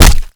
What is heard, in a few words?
Projectile Sounds; Red Orchestra 41-45